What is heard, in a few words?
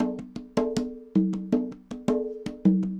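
congas bongos 80 bpm, latin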